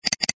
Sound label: Mouse Click Sound